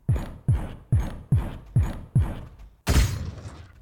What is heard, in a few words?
crystal brute walk; HLD